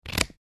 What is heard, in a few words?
Scissors cutting carboard